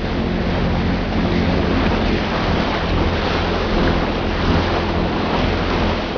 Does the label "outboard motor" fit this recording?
Yes